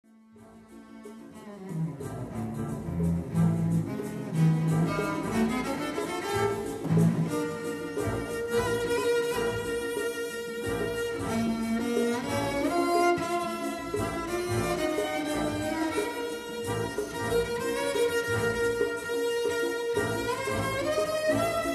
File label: cello